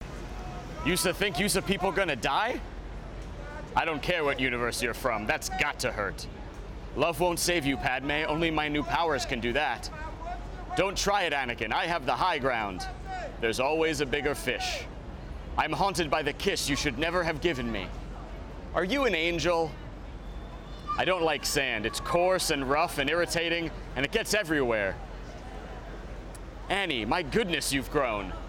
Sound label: Crowd Lav; cedar-demo